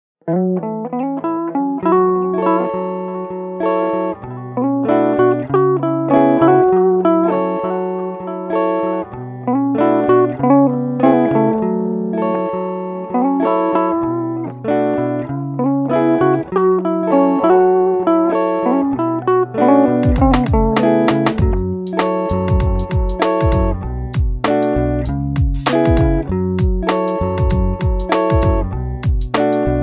GUITAR HIP HOP RINGTONE

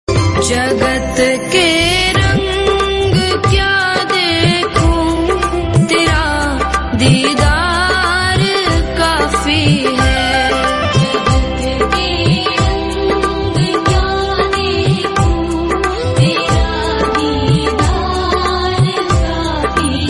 KRISHNA BHAJAN RINGTONE

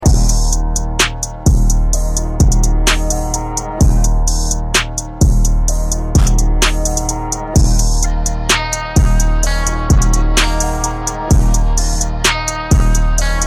HOTEL LOBBY INSTRUMENTAL RINGTONE

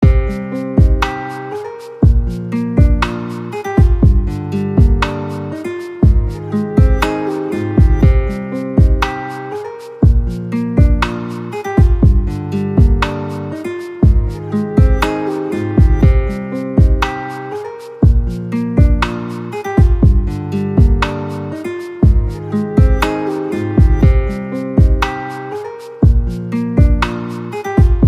instrumental ringtone

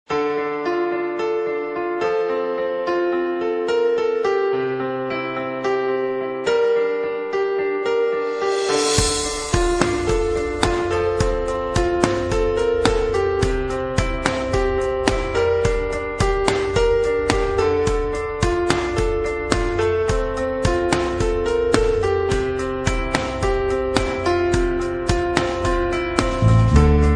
instrumental ringtone

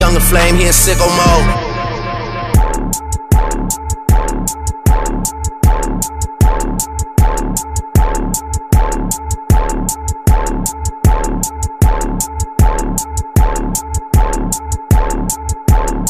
beats ringtone

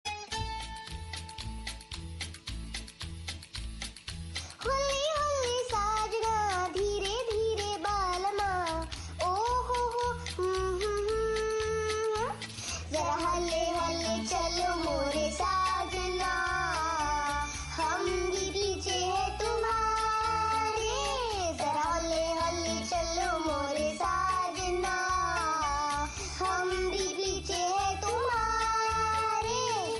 SMALL KIDS VOICE RINGTONE

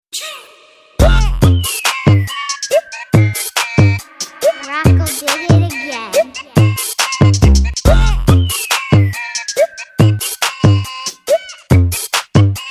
instrumental ringtone

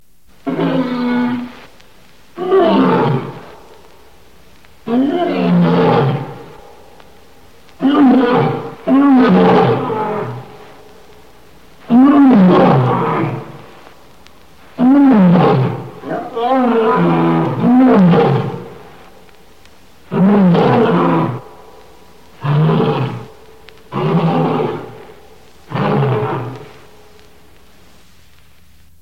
LION ROAR SOUND RINGTONE

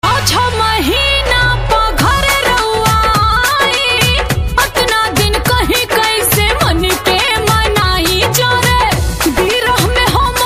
BHOJPURI RINGTONE

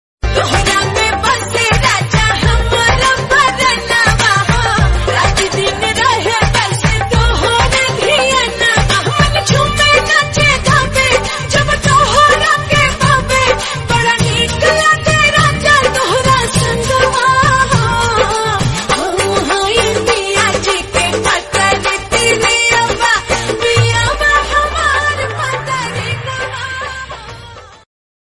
BHOJPURI RINGTONE

